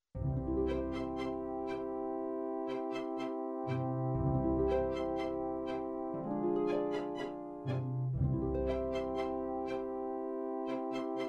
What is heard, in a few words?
melody backing dry